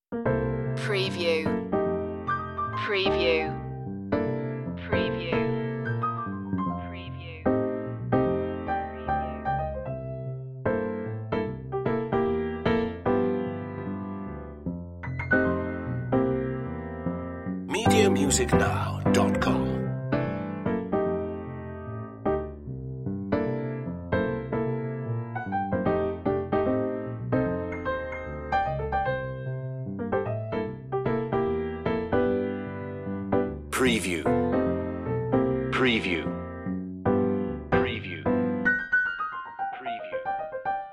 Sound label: jazzy family piano only version